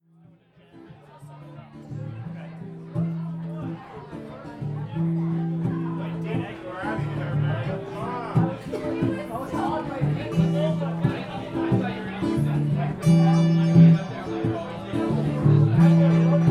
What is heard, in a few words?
Ambient Jazz Jam; PatsPeak